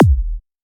Kick; LiLA'c Records PsyTrance Sounds 01

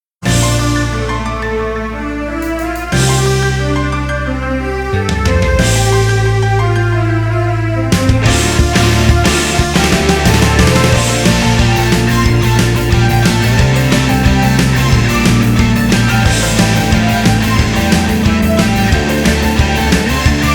off vocal

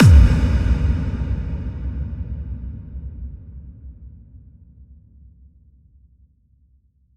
Kick FX

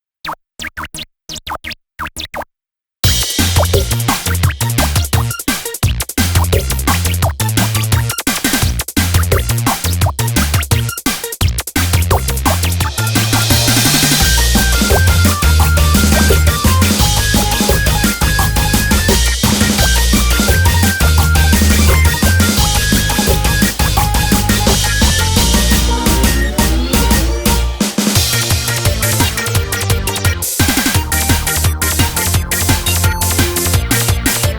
off vocal

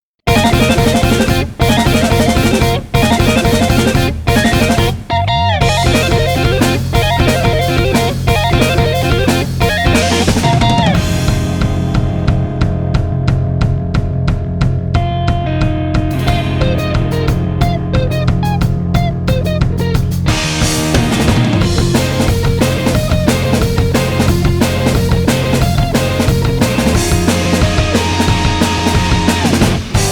off vocal

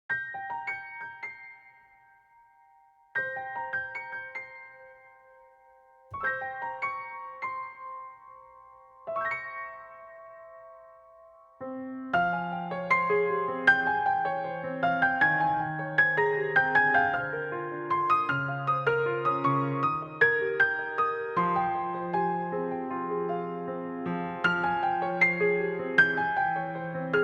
instrumental; 東方名華祭18

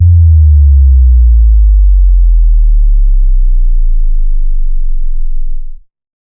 Sub Drop

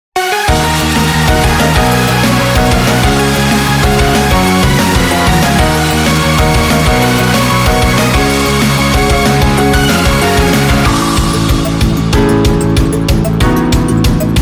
ignite instrumental

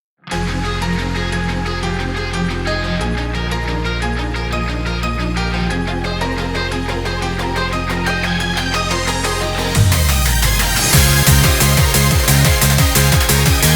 Off Vocal

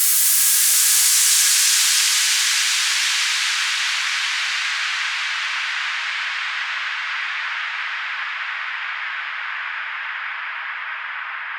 White FX

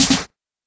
Snare, PsyTrance

